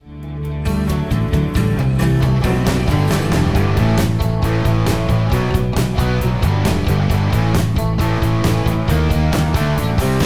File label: rock loop; heroes of the storm full gamerip 2015; starcraft terran1 part2